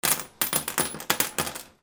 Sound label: INDUSTRY